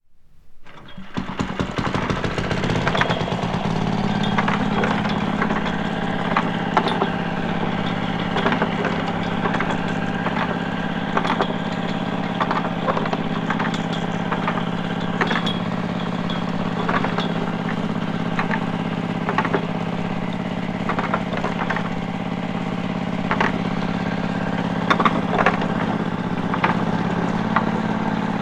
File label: two stroke petrol engine start